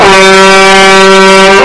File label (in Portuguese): vuvuzela estourado